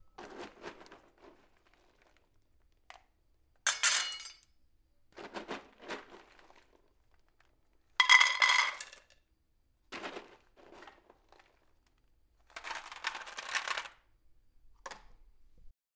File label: Filling Bowl With Dog Food